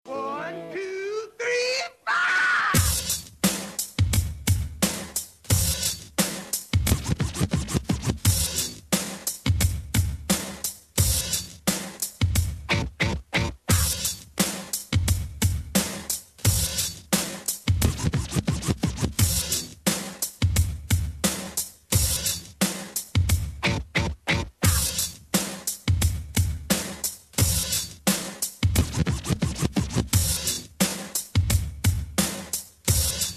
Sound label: Percussions moderato